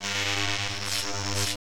ARC SEQ, ELECT NRG; Total Control - Action Cutz

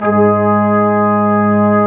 BRS QUIN, Quintet; Roland L-CDX-03

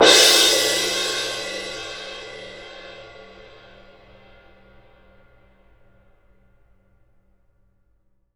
CYM F, Percussion; ILIO; Synclavier Percussion 2; World & Orchestral

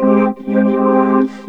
Korg, FX

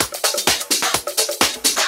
Latin Loop 128 BPM; House; Best Service; House Definition VOL-1

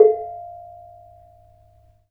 BONANG A4; Spectrasonics; Heart of Asia

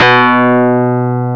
SYNTH PIAN; E-MU Formula 4000 Series Vol. 3; Analog Odyssey; EmuClassicSynths

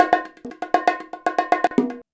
119 bongo

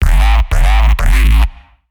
Reese; neuro; BIG5AUDIO

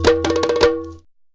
SABARO ROLLS; Best Service; World Colours

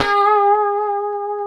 A 4C, COMPR STRAT, Guitars; Spectrasonics; Hans Zimmer Guitars VOL-1; 90's Sample CDs

